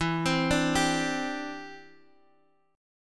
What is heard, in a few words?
E Minor 7th Sharp 5th, Guitar, Half Step Down